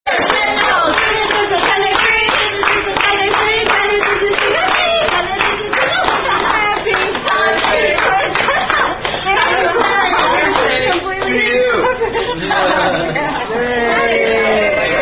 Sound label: Birthday Song, Happy Birthday (Tune Sound Off (The Duckworth Chant); Red Robin Happy Birthday